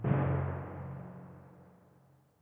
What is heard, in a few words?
Tymp